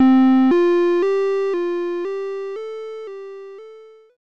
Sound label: Unused Jingle